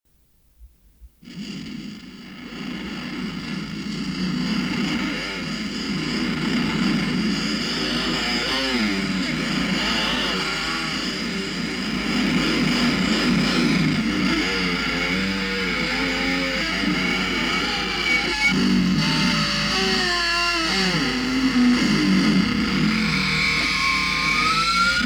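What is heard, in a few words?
noise guitar; 1992; demo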